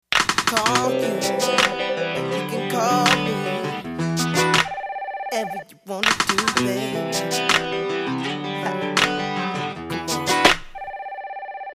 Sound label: Ring Tone; made in WavePad